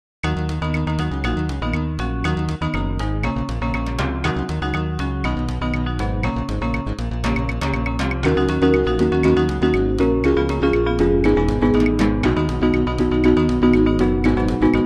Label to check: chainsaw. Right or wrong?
Wrong